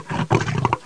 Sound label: GROWL; duke nukem total meltdown